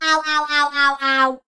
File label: robo death